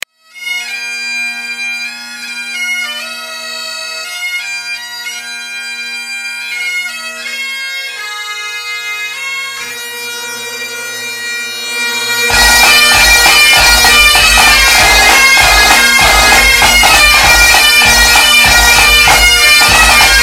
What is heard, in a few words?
Bagpipes drums